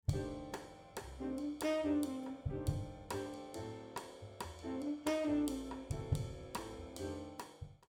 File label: Overheads Raw